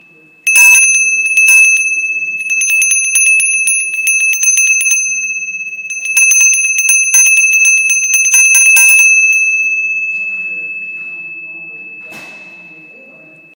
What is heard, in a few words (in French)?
Cloche